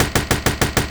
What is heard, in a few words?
gun